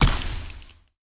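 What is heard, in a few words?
ice prick, impact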